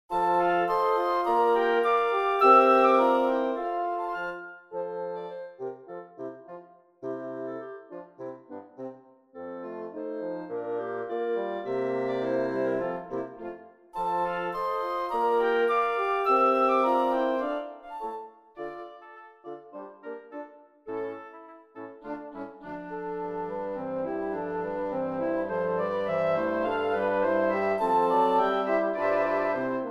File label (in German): tutti